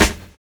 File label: FAT DISCO; Univers Sons